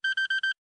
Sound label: Time Warning